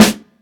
tight lows steel snare drum a key, Royality free snare sample tuned to the A note; snare-drum-samples